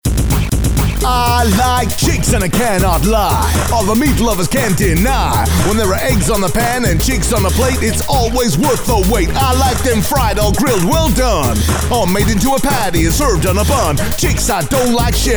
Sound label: Rap